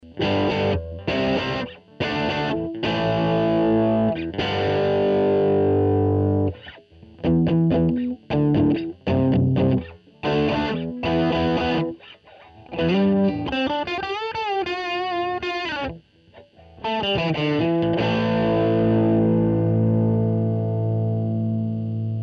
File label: class ab subminiature; electronic